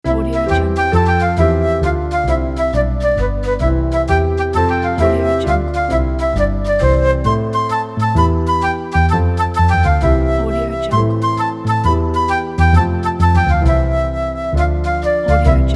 happy spring

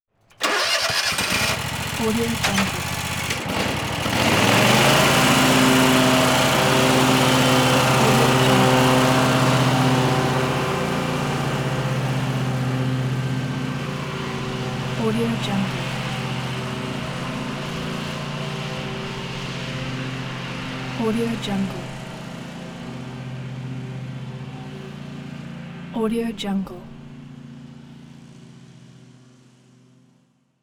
riding lawn mower start run

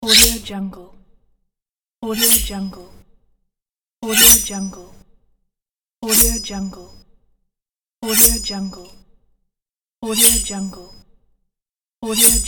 big sword swoosh set

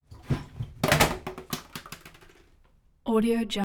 soda bottle single plastic soda bottle full

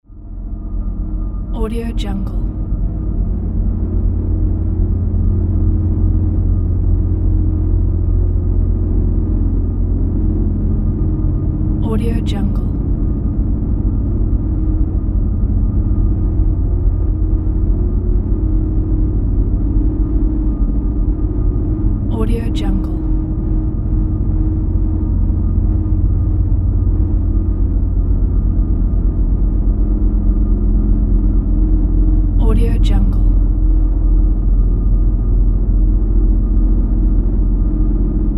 deep dark drone

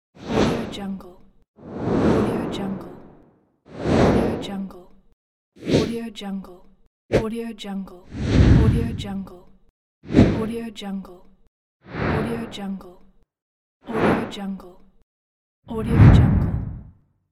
swoosh

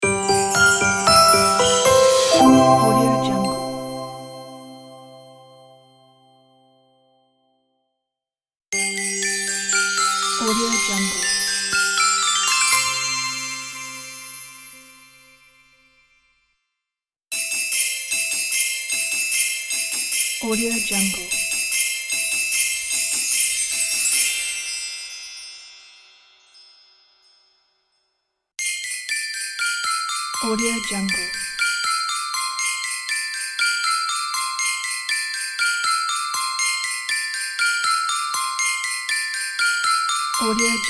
holiday jingles logo pack

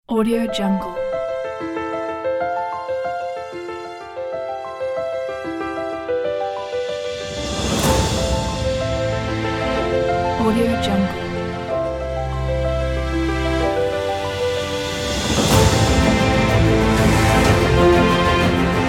emotional cinematic; voidsound

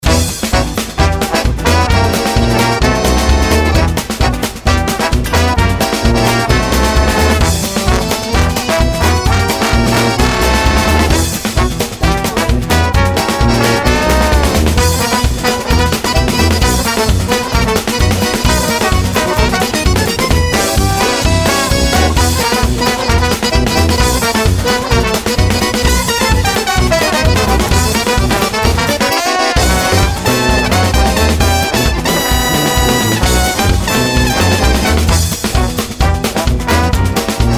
gypsy band

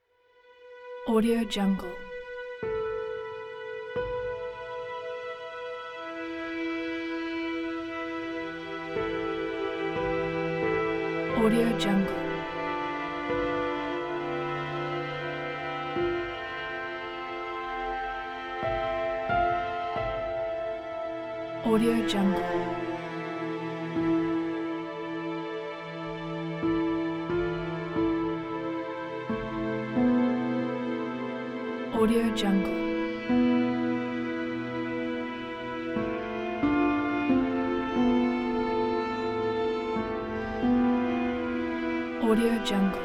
sad piano strings; epicmusicmania

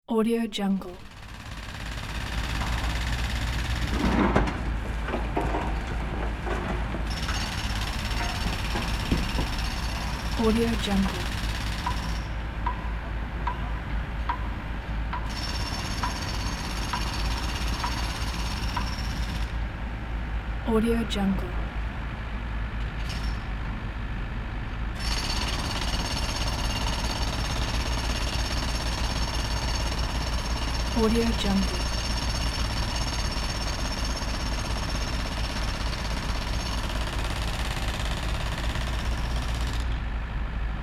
construction site

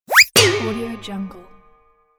cartoon slip pot hit

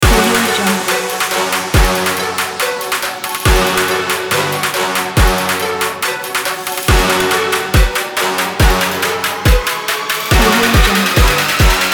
trap

